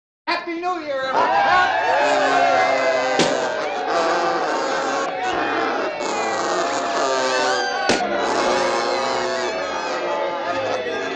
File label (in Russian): lyudi sobralis vmeste chtobi spet zvuki s novim godom